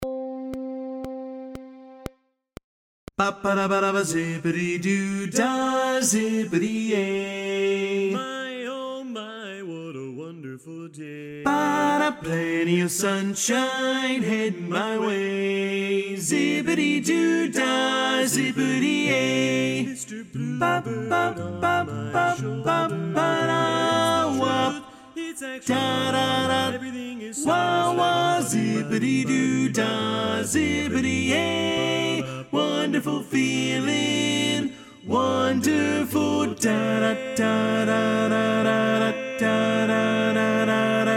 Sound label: Lead